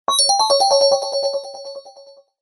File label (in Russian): opoveshenie sms